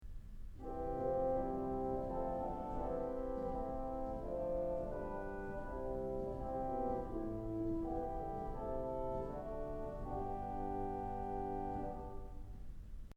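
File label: English Horn; Kellogg Auditorium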